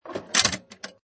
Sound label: Pinball Sound Ball Moves Triggering Several Metal Levers; Video Game; Lynne Music